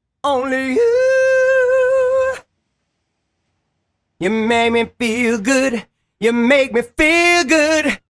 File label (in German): Vox Rauschen neutral